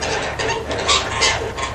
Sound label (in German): grummeln, Katharinasittiche, Lautäußerungen